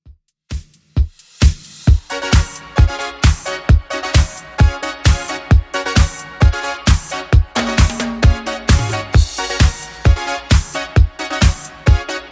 80s Redrum 132bpm

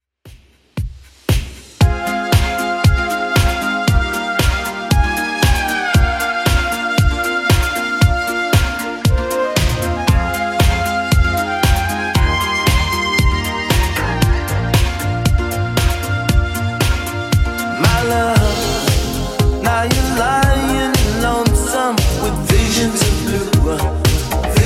80s Redrum 116 bpm CLEAN

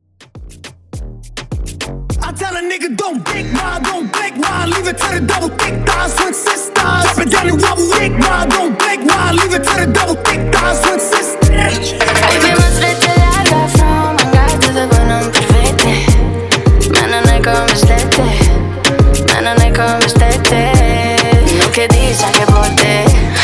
Moombahton 103 BPM; VIP Intro Edit

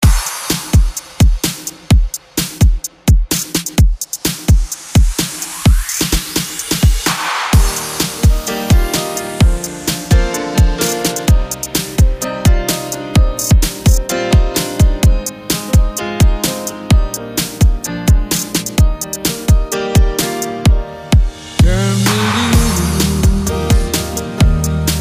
breakbeat 128 bpm

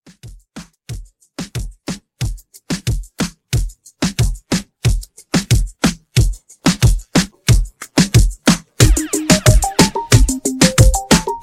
Reggaeton Club 91

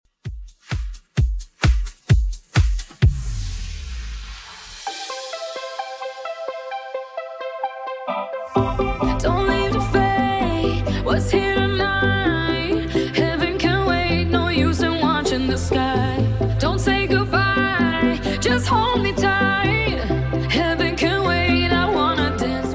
Club House 130bpm

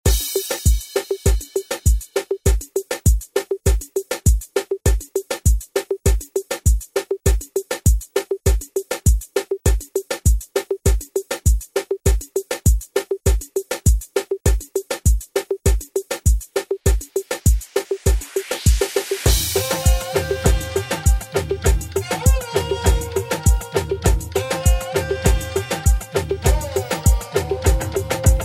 90s Re Drum; DJ Mhark

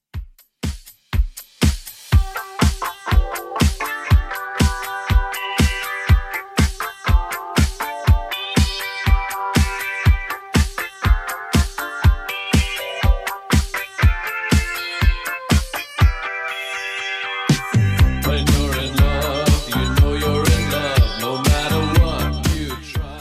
80s Redrum 121bpm

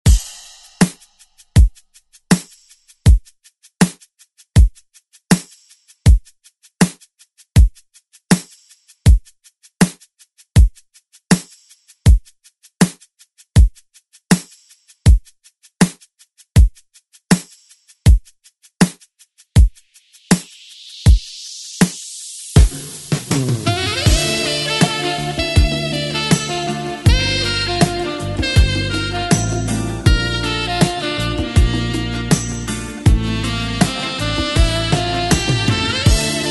2023 ReDrum

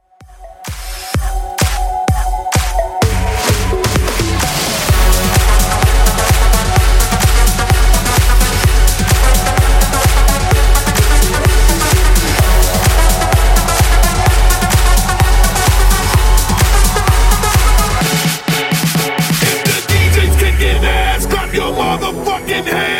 Rave Hype 128bpm DIRTY

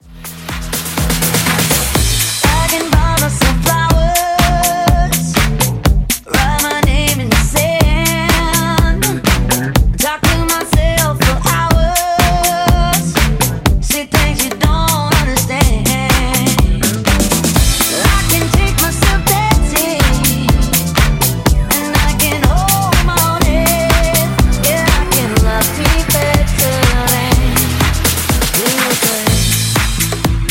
123bpm Am

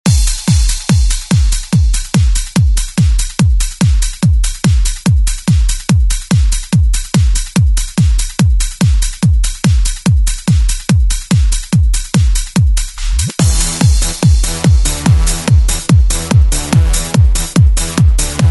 90s re drum; DJ Mhark

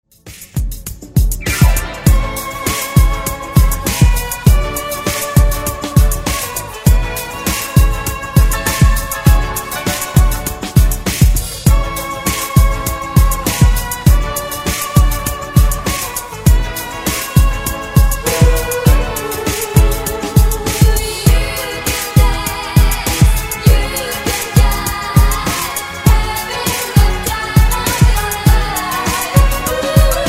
70s Ext Redrum

